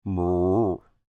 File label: Creature Speak Groan Short, Human; Groaning; Big Room Sound